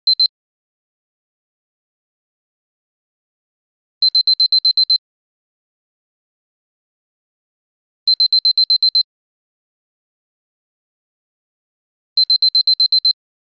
Clock alert

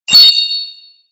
Tone, Message; i-mobile; ZAA-1

